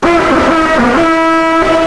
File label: dootidoot